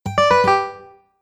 Game Bonus Descending Strum, Video Game Sound Effects